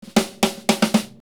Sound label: intro, drums loops